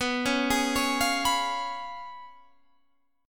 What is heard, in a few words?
Db 7th Cb, guitar; Db7/Cb arpeggiated